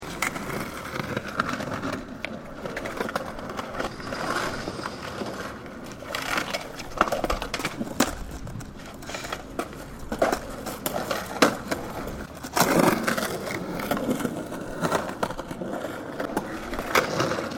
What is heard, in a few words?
Skateboarding, Kids skating on boards on concrete slopes; Playgrounds; Backgrounds